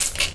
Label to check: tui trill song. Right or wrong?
Wrong